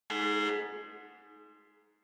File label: Electromagnetic Buzzer Hall